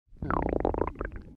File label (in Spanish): Tripas